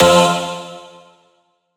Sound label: drop choir